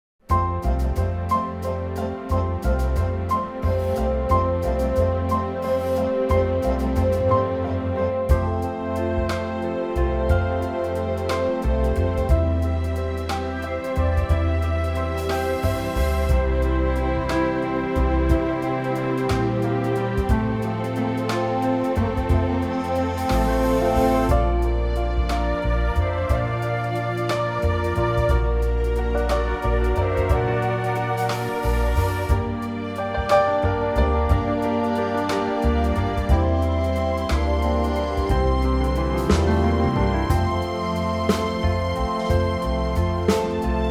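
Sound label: Orchestral F Gb G, backing track; key - F to Gb to G - vocal range - F to A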